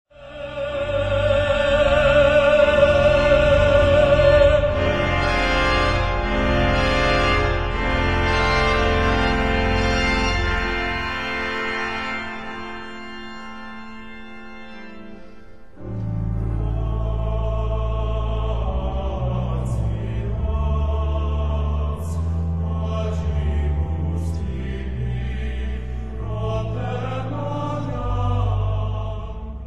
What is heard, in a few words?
Missa Cum Jubilo, for baritone, baritone chorus, orchestra & organ; Durufle; Op. 11